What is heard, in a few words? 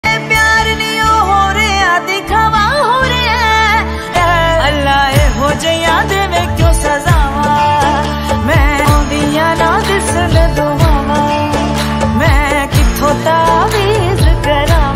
punjabi ringtone